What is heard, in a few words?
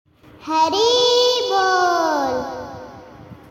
Karaoke